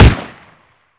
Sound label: mac10, weapons; cstrike